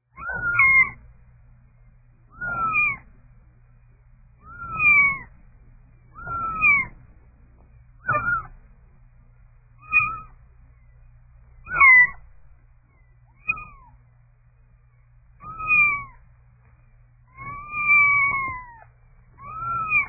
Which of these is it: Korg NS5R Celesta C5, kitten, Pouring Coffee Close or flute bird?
kitten